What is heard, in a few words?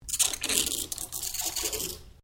Water Bath Spa Water Empty; Domestic water movement; Nature